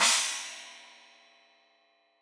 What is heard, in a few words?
China, Crash, China Cymbal; Deeply Sampled Drums; SMD Cymbals Stereo